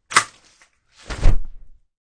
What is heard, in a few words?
Umbrella opens; Umbrellas; Objects